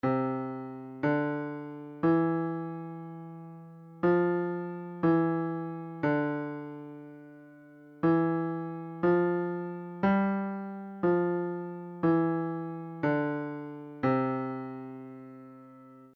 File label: up and down left hand, piano